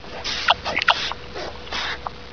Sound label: chew